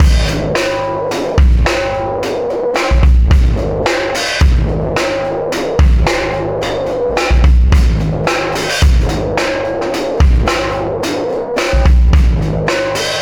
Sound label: System Overload Beat, Processed Drumkits